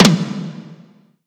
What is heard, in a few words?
Tom Flam E; samples